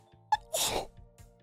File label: Sneeze